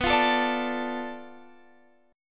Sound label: B Minor 7th Flat 5th B Guitar Standard; Bm7b5; x,14,15,14,15,17; strummed; capo=2